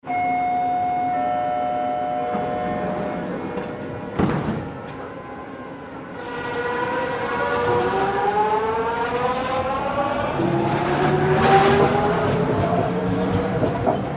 Monorail, Train Mass Transit Monorail Close Doors Drive Away; Trains